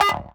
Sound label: Bleep Alarm Digital; FX Hit; Drums; Ableton Live 12 Lite; Core Library